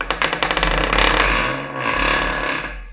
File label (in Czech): vrz; Mafie 1